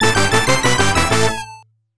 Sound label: Touchdown Stinger; NFL 95; Genesis